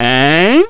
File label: Man "Ehh"